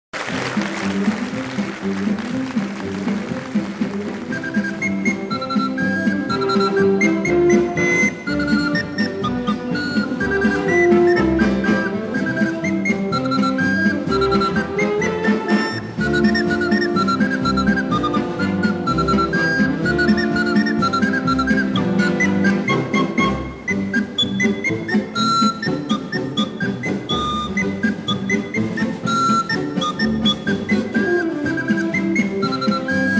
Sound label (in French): En Sifflant la Polka